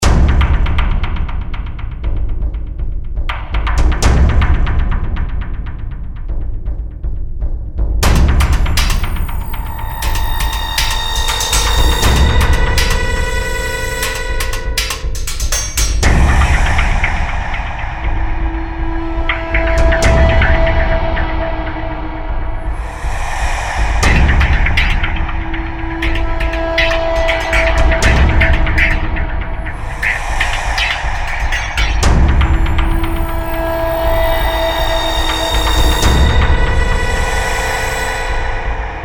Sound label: music